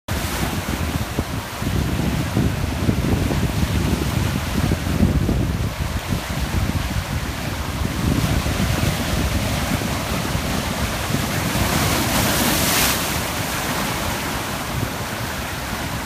Table Mountain and Ocean Sounds from beautiful Blouberg Cape Town